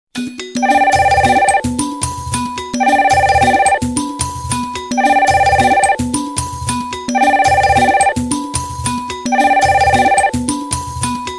muzika i zvonok

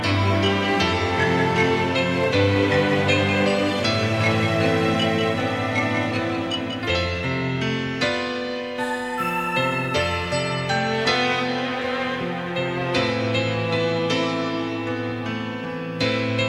otlichnaya muzika

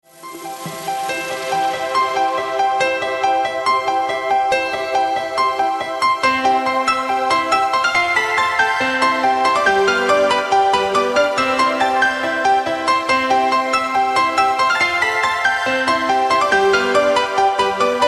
otlichnaya melodiya

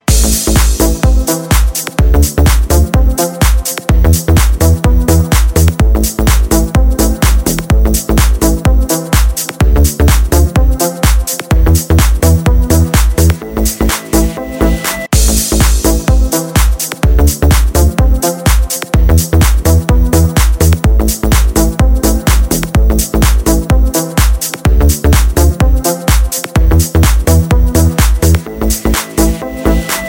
ритмичный house без слов

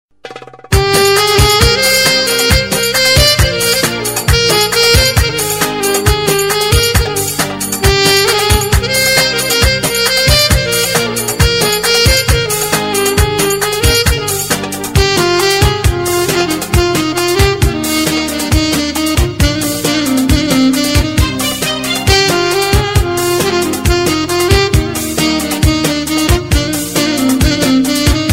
arabskaya krasivaya muzika

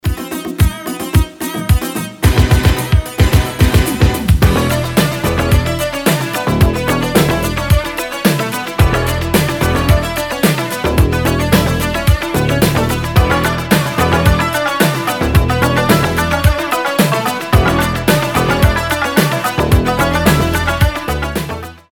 Восточная музыка; iz tik toka

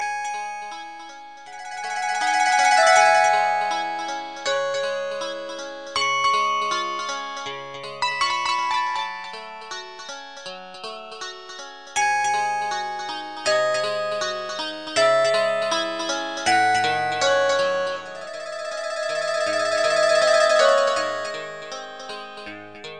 fortepiano

